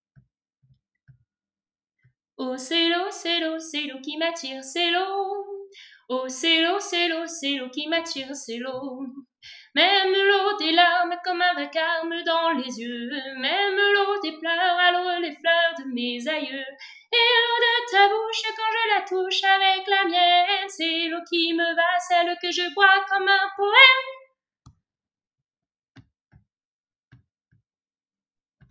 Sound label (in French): voix 2